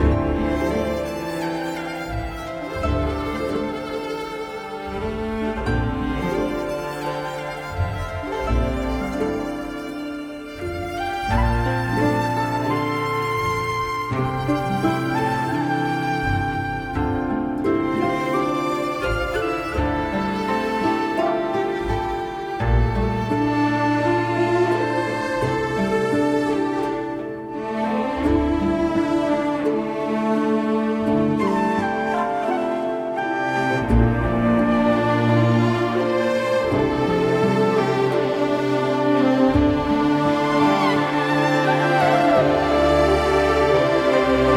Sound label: family bond loop